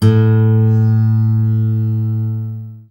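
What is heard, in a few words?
GUI Ac. A2, acoustic guitar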